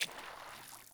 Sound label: Sandals Run Water, Footsteps; Characters; Player